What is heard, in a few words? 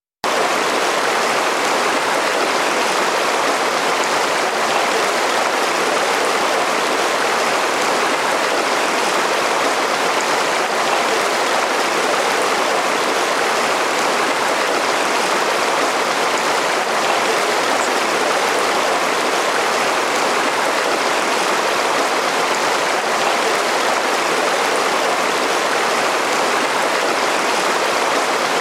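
Mountain River Medium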